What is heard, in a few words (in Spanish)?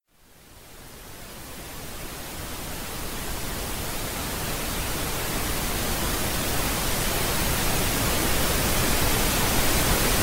ruido rosa corto